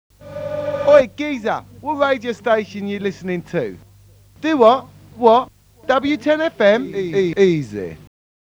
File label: Fm Radio jingle